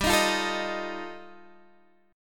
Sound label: G 7th Suspended 2nd Sharp 5th G, Guitar Half Step Down